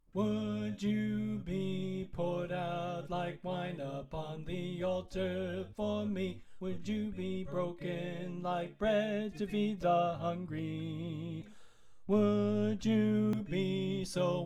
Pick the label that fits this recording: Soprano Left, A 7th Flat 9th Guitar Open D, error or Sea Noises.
Soprano Left